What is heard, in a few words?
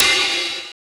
DANCE SET, DRUMS